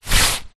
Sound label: raincoat sleeve arm movement walking single